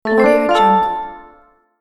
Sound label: gentle get ready bell